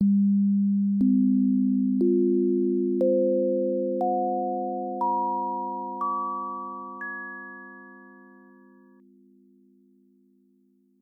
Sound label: bell